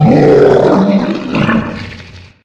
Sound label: vomit; video games; bonzo; Source Engine; tf